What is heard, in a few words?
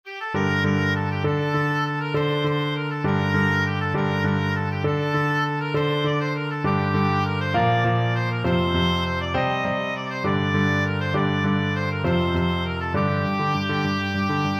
pirates, oboe